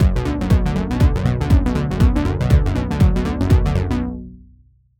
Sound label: arpeggiator swing